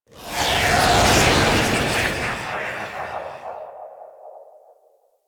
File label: Temple Assassins Spawn; Warframe; Ambience; Orokin Derelict; Red Veil Temple